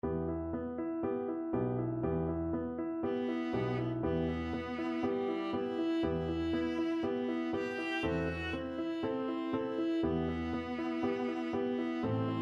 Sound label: mend violin, viola